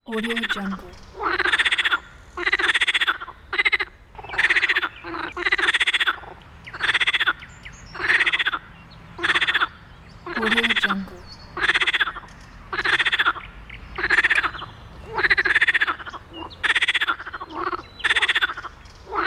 frogs

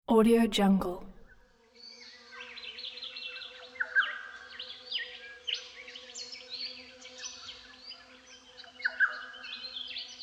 birds chirping

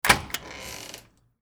creaky metal screen door open

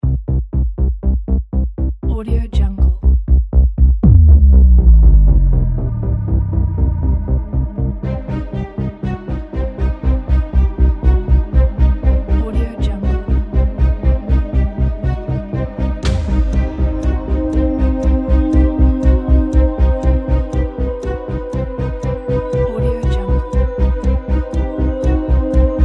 for tension

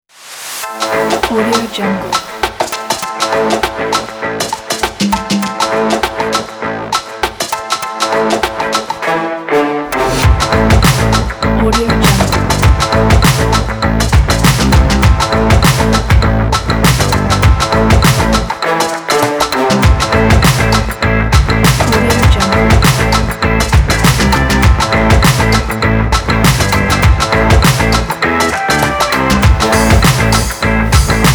stylish drive energy fashion pop rock

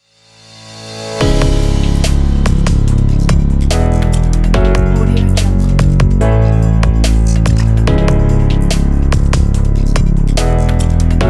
technology documentary

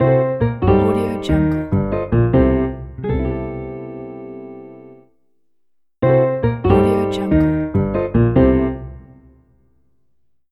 jazz logo; bluejaystudio

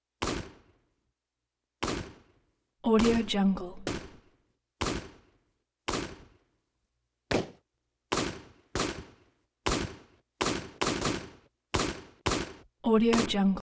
colt army model 1860 single shots

